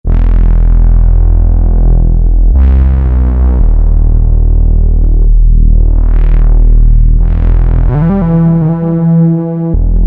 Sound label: Bass Pedals, Roland JP-8000; Factory Patches